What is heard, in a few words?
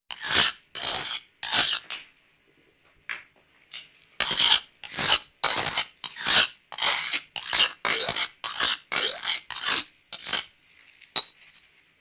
Concrete Mixing manual